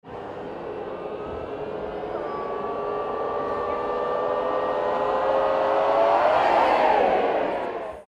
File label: laola wave